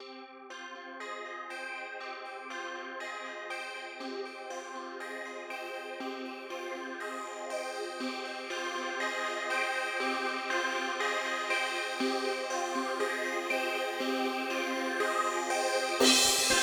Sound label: house loop